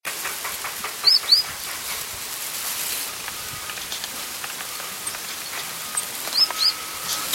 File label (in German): kohlmeise